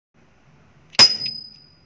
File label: keys